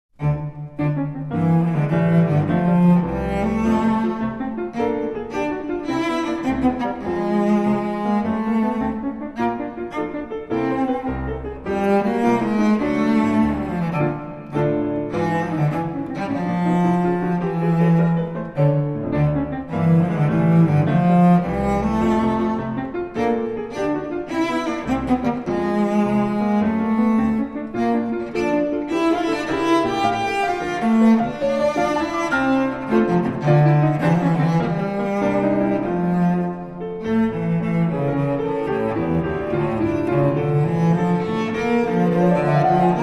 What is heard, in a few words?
Quasi Charleston For Violoncello And Piano